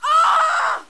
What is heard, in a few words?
Dies